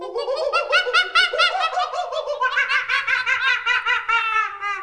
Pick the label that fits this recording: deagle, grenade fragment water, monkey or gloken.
monkey